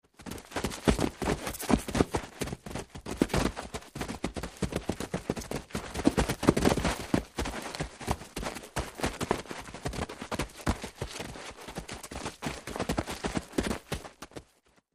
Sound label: Footsteps Group Sol